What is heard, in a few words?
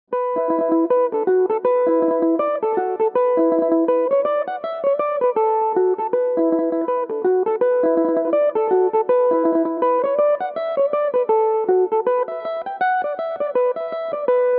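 E dorian, irish mandolin, tunes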